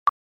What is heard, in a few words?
loud